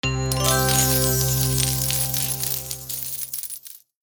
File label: Slot machine casino bonus round end